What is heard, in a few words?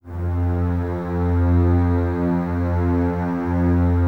Basses F 2, String Ensembles; Miroslav Vitous; LINKS ORCH